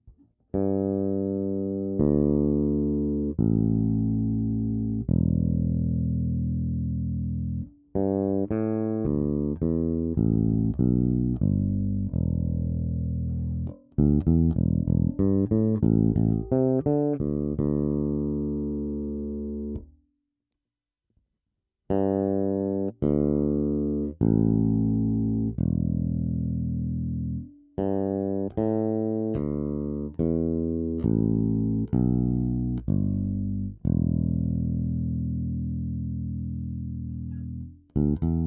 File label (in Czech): Ukazka 1 - prsty, Music Man Sterling baskytara; Ernie Ball; Pokud se mění zapojení přepínačem, tak je to vždy paralel, single, series; aktivka, vynikající snímač